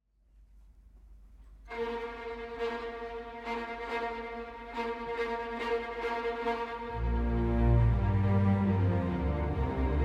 Noisy Life – In the Distance We Hear Bells Ringing and Mingling with the Sound of an Organ